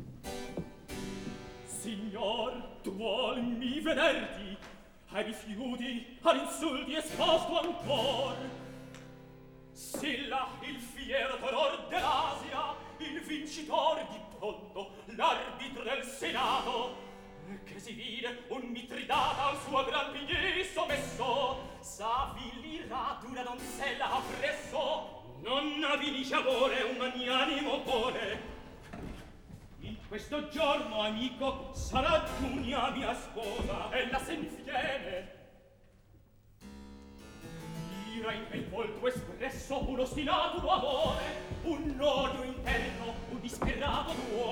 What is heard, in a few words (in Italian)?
Atto Primo Recitativo; Silla, Audifio; Mozart; Operas; KV 135 Lucio Silla - 1772